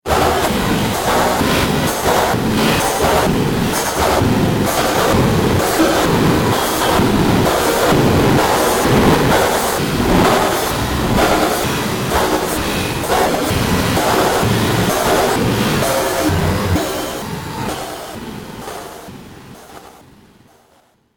Crazy Mega Machine, Sci Fi Music

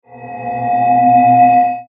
Metallic Ringing Reverb; Production Element Imaging Element Accent Transition; Metallic Sound; Blastwave

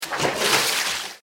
Water Sound Splash; Water Sound Effects; found sounds

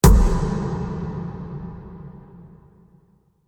Cinematic Drum Strike High Velocity; Low Impact Sound Effects

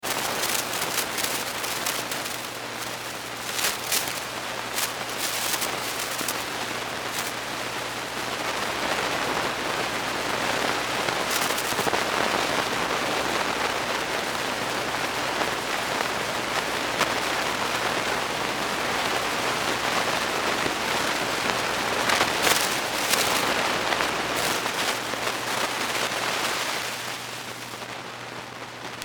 Static tube amp ca1960s, Static, Tube Amp; Tube Pa Amplifier Static , Constant , Some Bursts Of Noise; Scratchy

